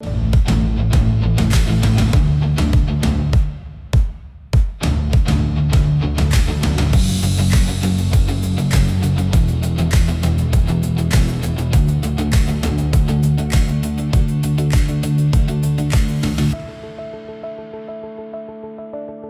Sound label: loop